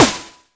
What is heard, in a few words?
Snare; ModelCycles